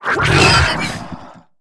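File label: wraid attack; Star Wars Knights Of The Old Republic I; XBOX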